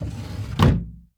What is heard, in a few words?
Dresser Drawer Close; Doors & Drawers; 1001 Sound Effects